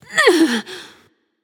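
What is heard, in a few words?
grunt hit, female; smite; voicepack; hera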